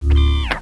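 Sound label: weapon hud off; gearbox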